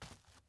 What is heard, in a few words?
dirt step; teardown; ps5 windows xbox series xs